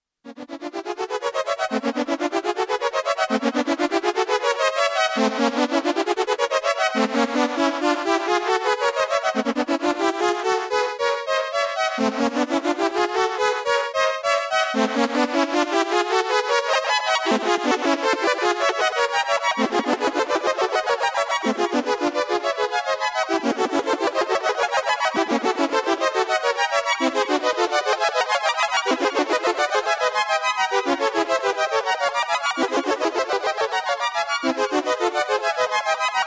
ARP 1601 Terz

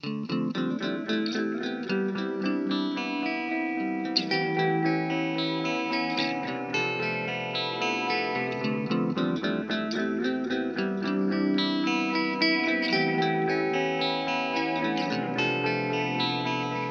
Teisco Delay

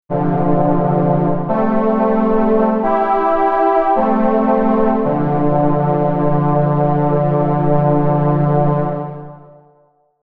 Akai MPC 2.10 Solina

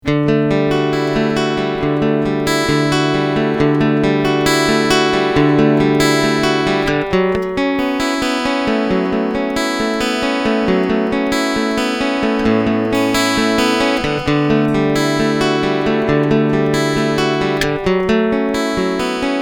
Picking EQ Out

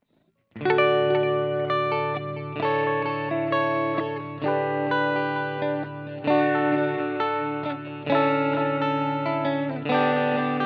GFI System Orca Delay Digital

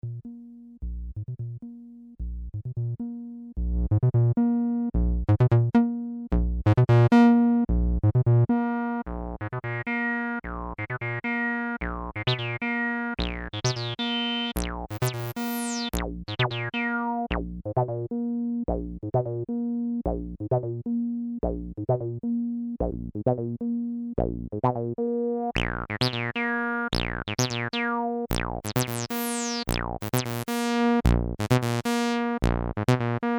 Roland TB-303 Accent Slide Test

